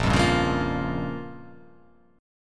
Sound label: A sharp Minor 6th A sharp down, chord